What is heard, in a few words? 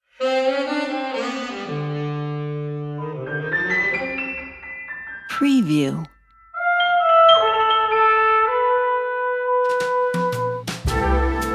noir evening